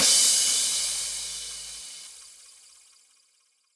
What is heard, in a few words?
EDM Future Crash, Cymbals; Big EDM; Future House Tools